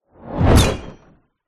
sound design shockwave whoosh into glass and debris smash